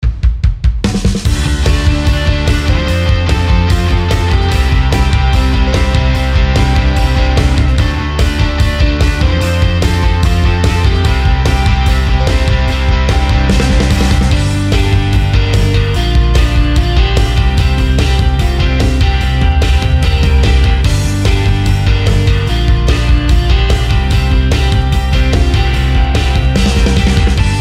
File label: pop rock story